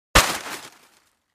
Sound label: Foliage Lawn Bag with Branches Type 2 Dropped on Ground; hits & impacts